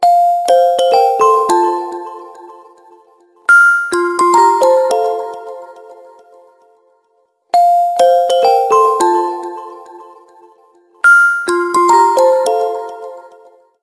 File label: nokia x7 sms tone ringtone